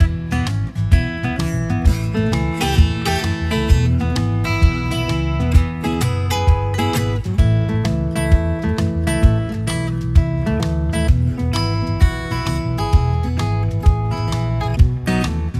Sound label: Acoustic Blues Shuffle 130 bpm